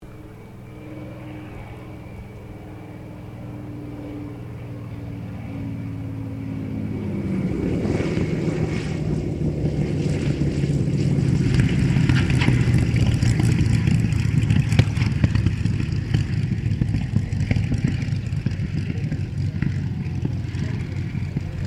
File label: AIRCRAFT SUPERMARINE SPITFIRE MK-VIII LANDING; Airplanes; Vehicles; Airplane Sound Effects